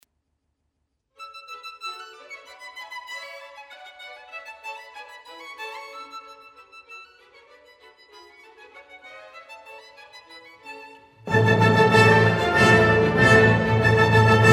Violinkonzert; 3.Satz

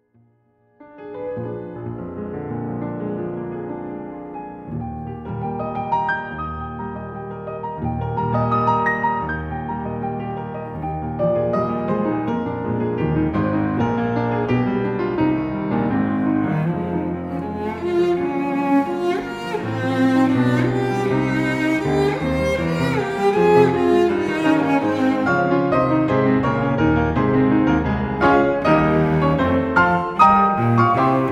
cellosonate; 1.satz

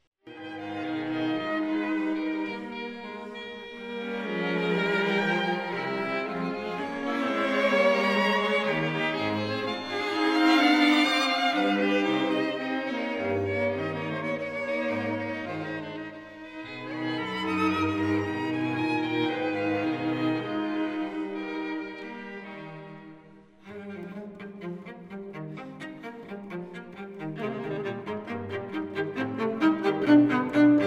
Streichquartett

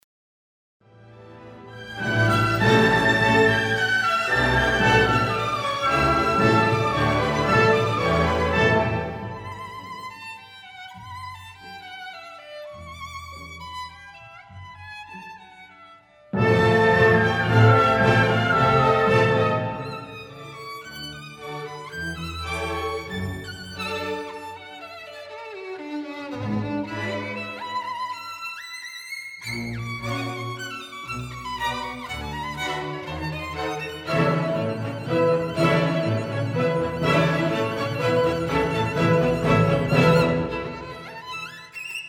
Violinkonzert; 1.Satz

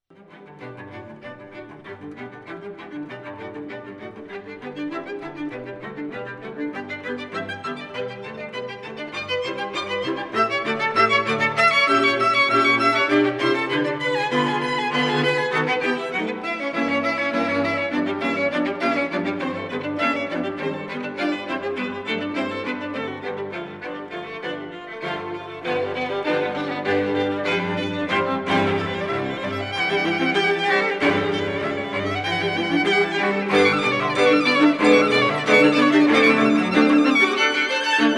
Streichquartett

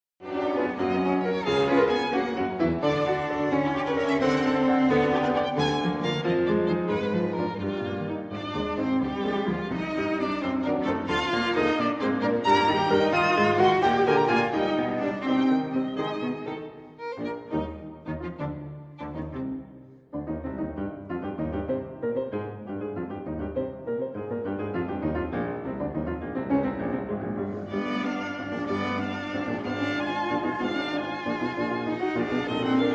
klaviertrio satz

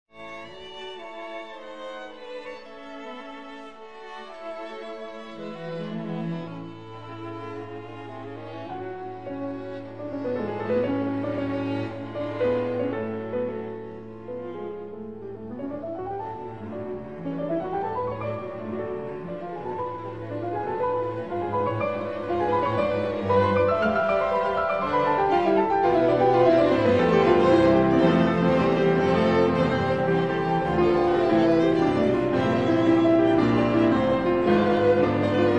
klavierquintett satz

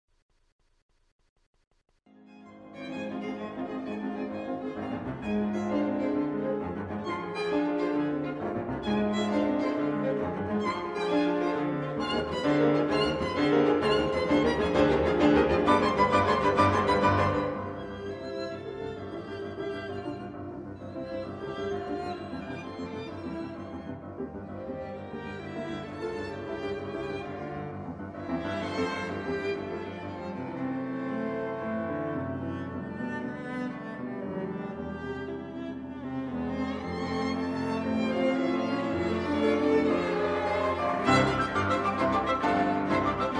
Klavierquintett; Minguet; 4.Satz

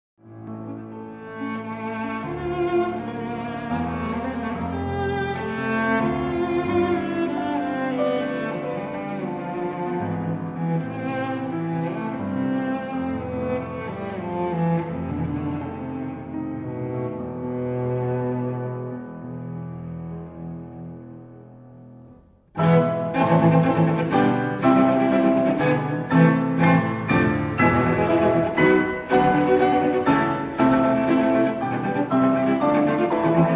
cellosonate; 3.satz

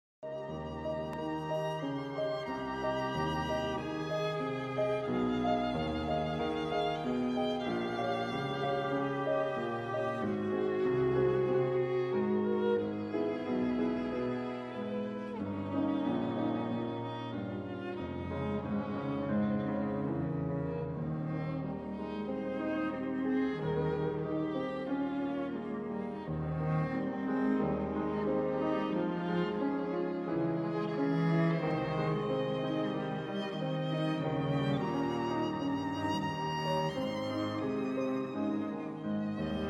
klaviertrio satz

